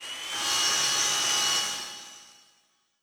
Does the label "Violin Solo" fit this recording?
No